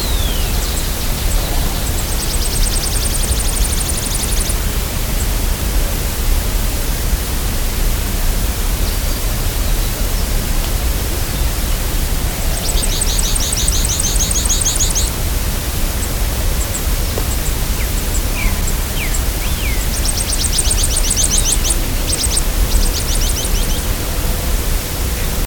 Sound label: bird